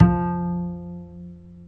upright bass f4; Upright Bass Scale

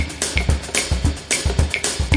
smooth samba, 110 bpm, drum loop; 101 to 110 bpm

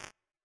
machine, Machine Electro Hits; STYLE KITS (Hip Hop, Etc)

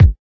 kik

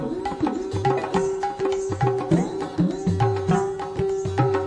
103 ethno, drum loops and breaks; Sonogo Aian Dada

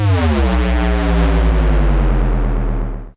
bass crawl, Synth; single samples

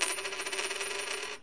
coin drop; Sound FX Real Life

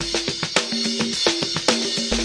wickedthin, 106 bpm, drum loop; 101 to 110 bpm

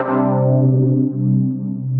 Synthesizer Middle C Notes, Lead

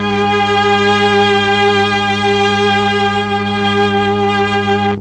mellotron string; Orchestral

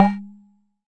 Jomox MBrane, drum machines; Jomox MBrane 11

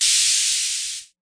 Hats, Roland T6; DRUM MACHINES

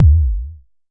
Jomox MBase, drum machine; Jomox MBase 01

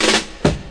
reggae break, DRUM LOOPS and BREAKS

